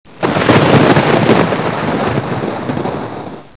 thunder

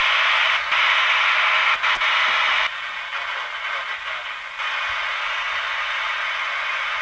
radio; de undersupply

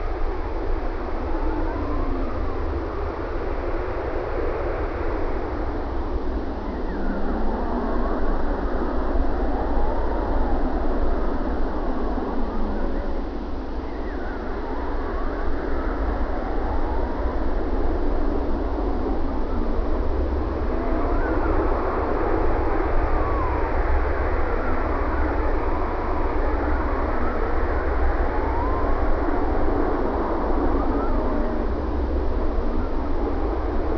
Evil ambience

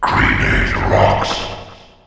grenade

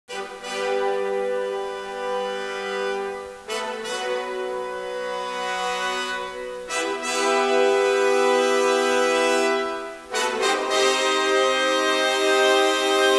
hero enters

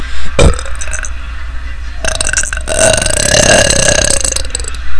burp

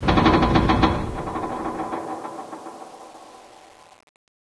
distant creak

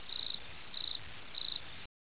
cricket

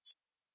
amb medkit; hellhole

